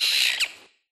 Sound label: Bird DOT; smite 2; Aphrodite